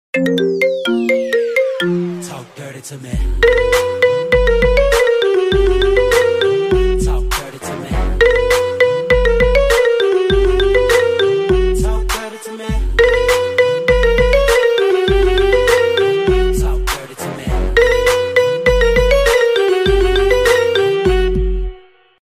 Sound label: Marimba Remix